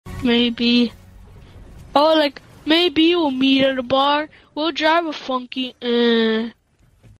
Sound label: funky ehh